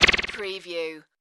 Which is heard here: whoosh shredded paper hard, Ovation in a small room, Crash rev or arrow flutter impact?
arrow flutter impact